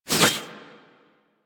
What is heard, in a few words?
Warfan Whoosh; Warframe; Weapons; Melee